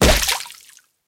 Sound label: hit